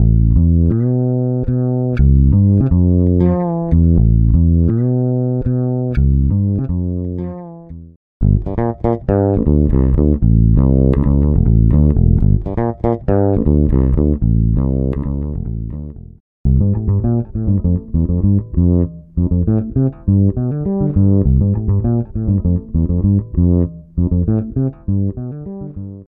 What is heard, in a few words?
fretless bass smooth as silk